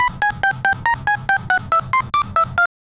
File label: exciting loop drop sound